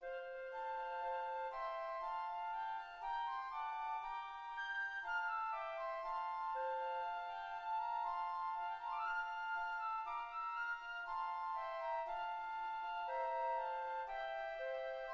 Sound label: When I Survey Flutes